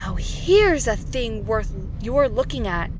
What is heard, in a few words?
noisy